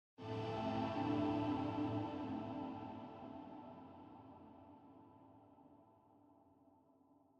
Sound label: Cave Music Success Stinger